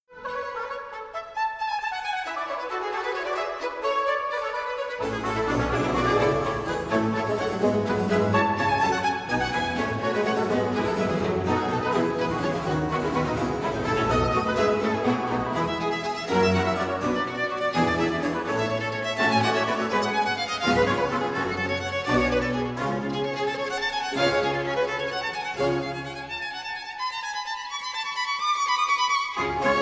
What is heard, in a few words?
Ouverture; Handel; Teseo